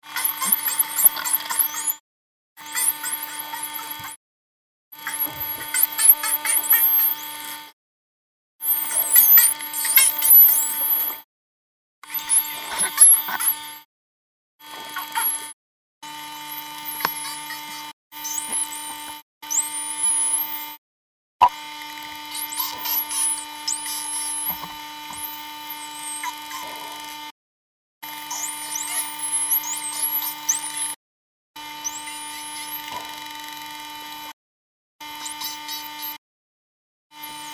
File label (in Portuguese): Sons rotadores pulsados